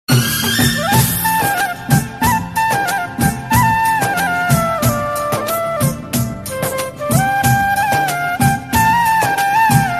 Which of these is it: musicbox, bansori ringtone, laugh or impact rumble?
bansori ringtone